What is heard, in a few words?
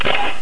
Ammo